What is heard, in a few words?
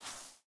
slide; Smite; Baron Samedi